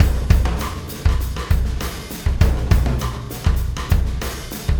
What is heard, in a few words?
Wormhole Beat, Processed Drumkits